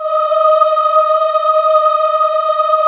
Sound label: AAH; AMG - Vince Clarke Lucky Bastard